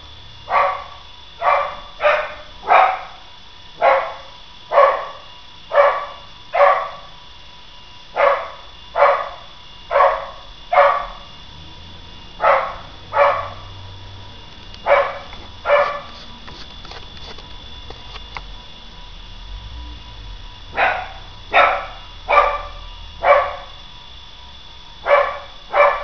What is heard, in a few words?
barking